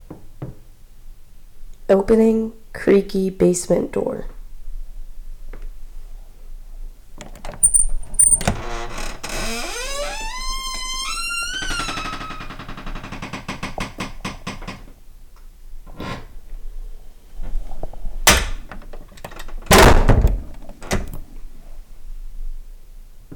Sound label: Creaky Door